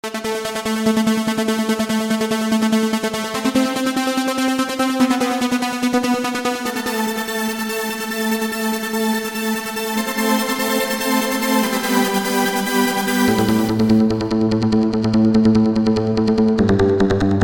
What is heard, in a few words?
chopping